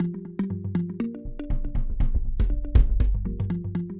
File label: Mallimban Gm7 120